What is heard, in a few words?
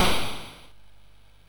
sid MOS6581R3; Gumshoe